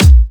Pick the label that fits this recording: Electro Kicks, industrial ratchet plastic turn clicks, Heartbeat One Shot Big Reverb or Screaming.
Electro Kicks